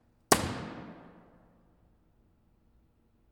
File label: reverb Rm 206 Friday morning; Pitzer; Gold Center